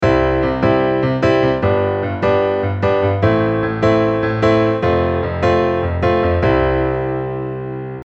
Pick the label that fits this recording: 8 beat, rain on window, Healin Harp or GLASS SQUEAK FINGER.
8 beat